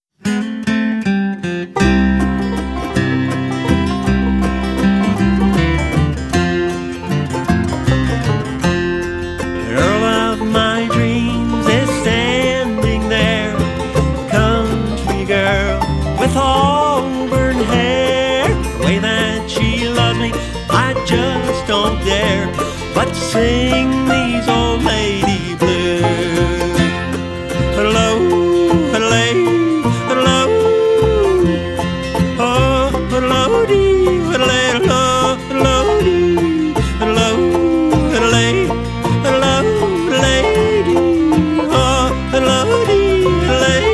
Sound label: Old Lady Blues